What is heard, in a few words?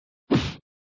Sound Slash, flipper; Slash v3